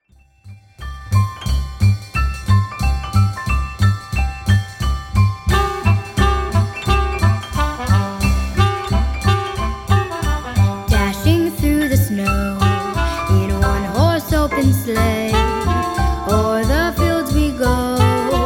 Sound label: jingle bells